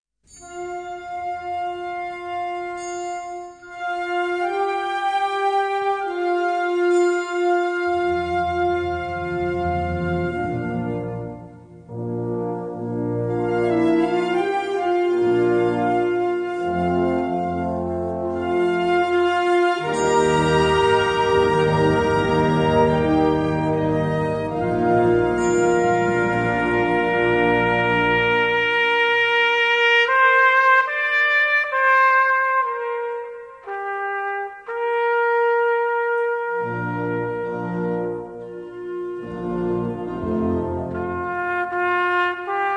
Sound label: Dialogue Two Trumpets And Band